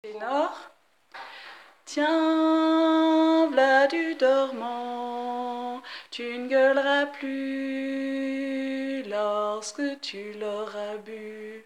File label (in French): Dormant tenor Refrain